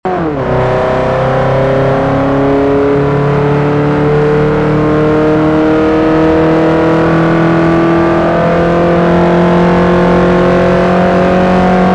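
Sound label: third, car engine; tdmcars; spark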